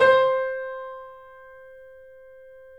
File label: C -L, The Piano; InVision Interactive - The Piano